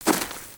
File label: Footstep; Lego; Strombie; creatures organic